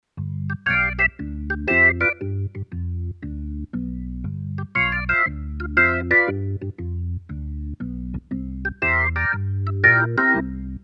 Kawai ES-520 Jazz Organ

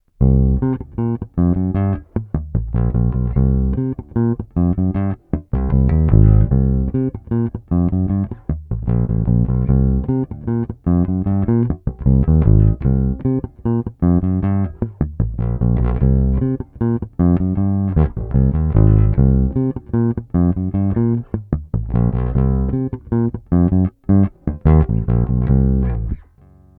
Finger funky rolloff

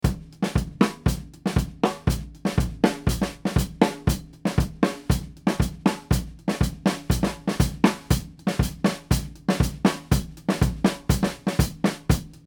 Drums 6db Digital Gain

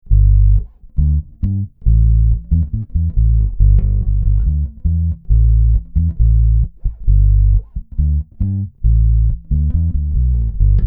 B4 plus AS Reggae active; Cort

